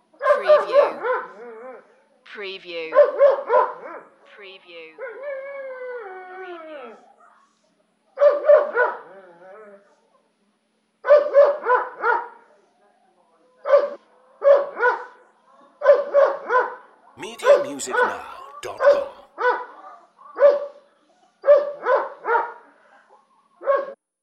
Dog Poodle Barking Vintage

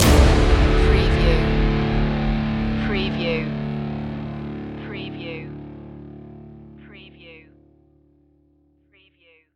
battle in 8-bit space stinger

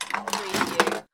Pinball sound, ball move and hit metal objects

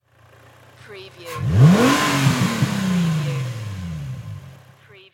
INFINITY G35 ENGINE SINGLE REV

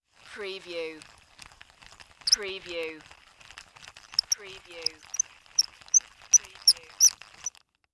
Fishing Rod Reel In

